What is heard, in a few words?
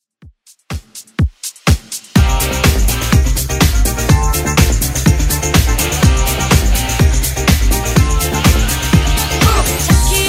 80s, 124 bpm